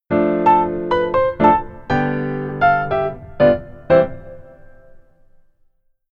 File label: Keyboard Solo Left Hand